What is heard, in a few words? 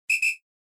Whistle x2